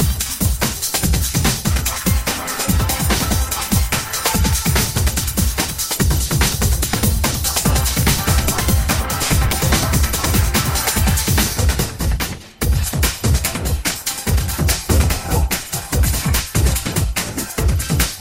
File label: a breakbeat made entirely from rave stabs